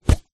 drink empty take away coffee cup plastic lid remove; food